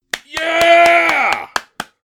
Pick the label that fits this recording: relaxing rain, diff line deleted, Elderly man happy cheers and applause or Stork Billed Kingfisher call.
Elderly man happy cheers and applause